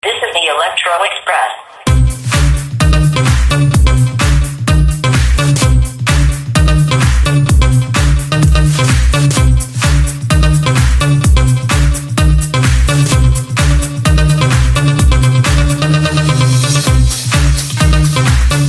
Electro

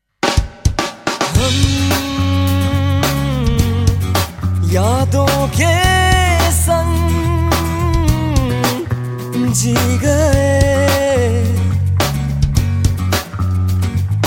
nice sound of guitar

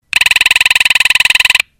Military Phone Short

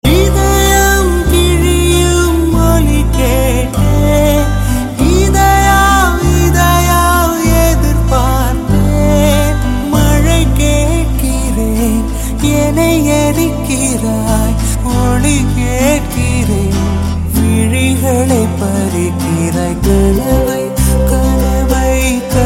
nanban sad

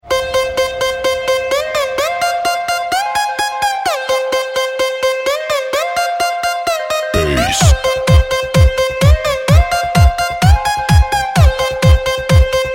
disco record tone; jazz

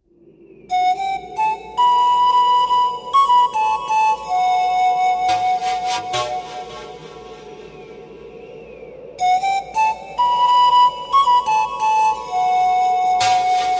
Flute

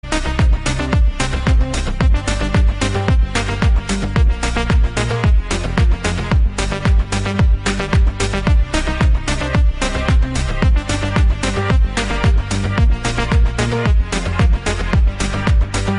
Dombra Remix

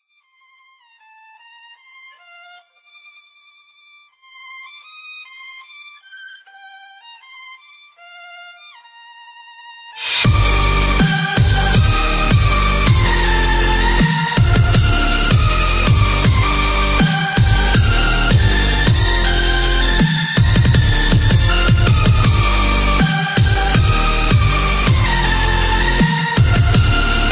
dubstep remix; spongebob squarepants

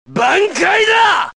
shout

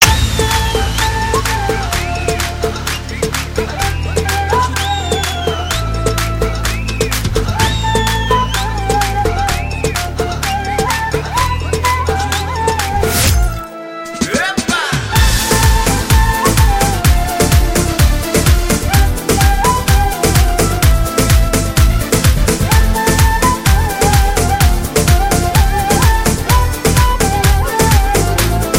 turkish dance

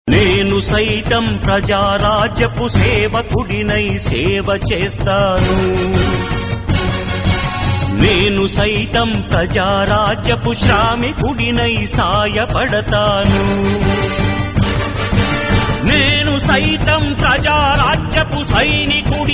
flute

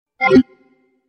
Cursor Cancel

